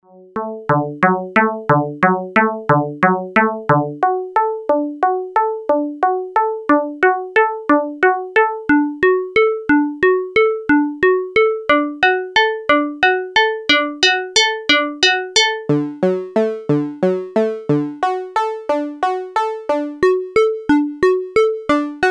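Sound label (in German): Buzzy Oszillatormodelle FM; Freds Lab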